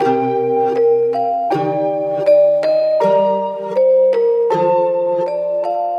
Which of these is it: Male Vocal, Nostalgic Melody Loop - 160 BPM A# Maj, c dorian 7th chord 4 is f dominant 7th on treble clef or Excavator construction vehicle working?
Nostalgic Melody Loop - 160 BPM A# Maj